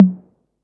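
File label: 808 Tom High; Concrete Collage Drum Kit; Concrete-808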